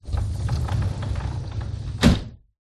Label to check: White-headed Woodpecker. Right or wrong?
Wrong